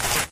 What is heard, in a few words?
bat draw; tf